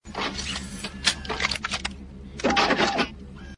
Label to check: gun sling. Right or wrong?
Right